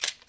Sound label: click